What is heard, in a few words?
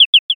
sparrow